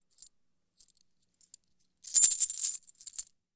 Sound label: Coins Jingle 02; Coin Movement Light And Jingle In Hand. - Jingling Coins